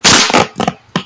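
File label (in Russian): скотч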